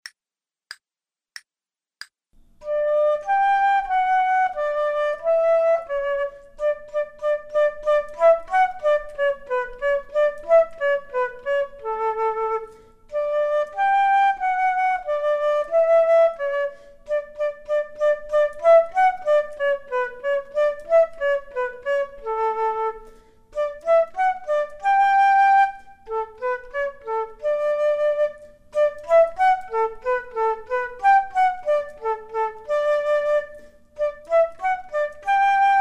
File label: Duets flute; Selected Duets for Flute Podcast